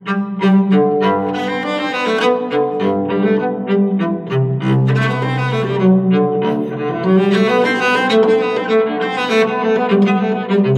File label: Joyful Bach Cello Suite Courante, ringtones; LG K92 5G